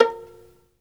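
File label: SOLO VIOLIN, Synclavier Strings; ILIO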